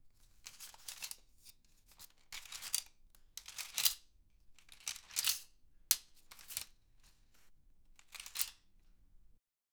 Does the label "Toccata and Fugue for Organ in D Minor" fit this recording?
No